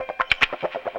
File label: CHUG, Funky Gtr Licks; E-MU Formula 4000 Series Vol. 1; Hip Hop Nation